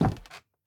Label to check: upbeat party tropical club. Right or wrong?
Wrong